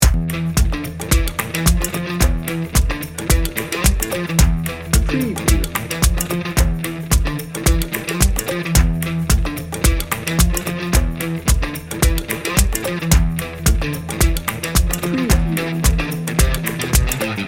epic and determined loop

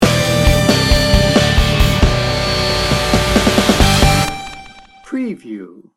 bright and driving sting bumper

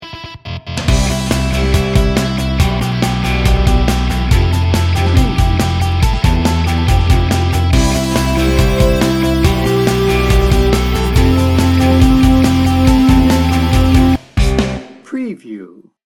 enthusiastic pop rock

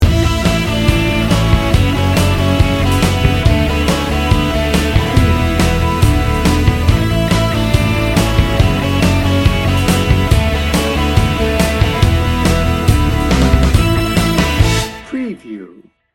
happy pop rock

